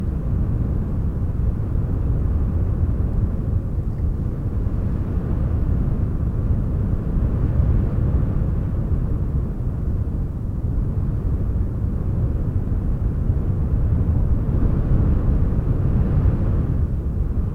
Wind; Hanger World; Rope Swing; android

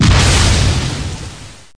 player bioclense

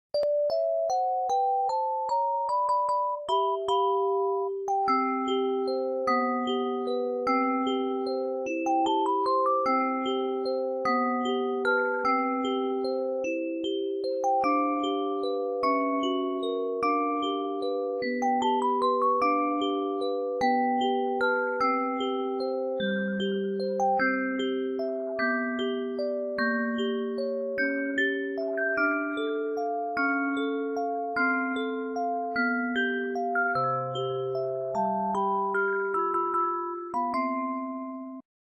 Musicbox; Five Nights at Wario's 3

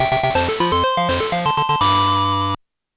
Fanfare - Bug-Catching Contest - Second Place

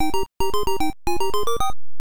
Round Clear; Door Door; Family Computer

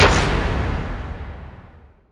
Bang; Surveylily Apartments

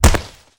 Hit Kick Pad; Lucius III

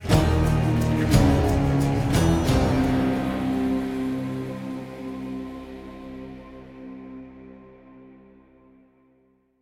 Theme Stinger INTENSE

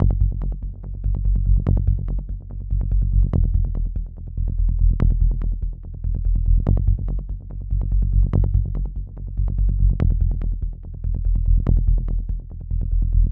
music level

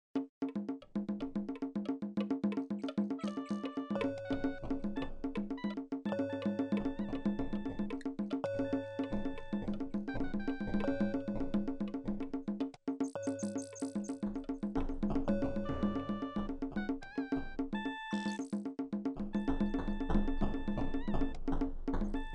Bazaar

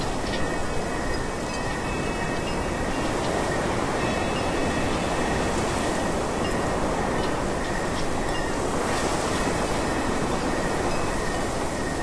Bamboo Ambience; Angry Birds 2

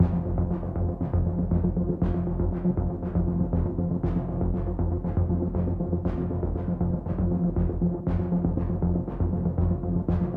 MUSIC Predator Noisy; Under Ace

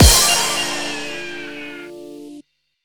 Retry Jingle; Friday Night Funkin' Fun is Infinite OST mod Windows gamerip 2022

